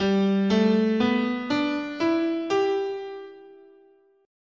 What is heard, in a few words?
G Major Pentatonic, scale